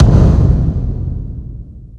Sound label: explode, marble; KevinBlast